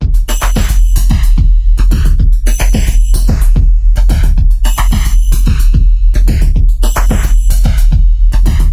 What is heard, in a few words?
bassline drumloop; bassline project